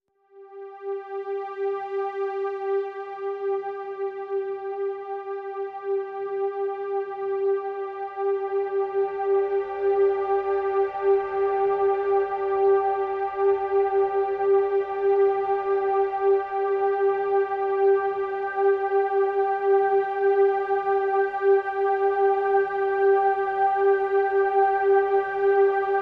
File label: Pads Vapors G